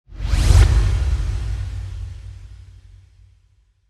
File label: computer swoosh